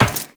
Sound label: Run Metal Perforated, Footsteps; Warframe; Characters; Tenno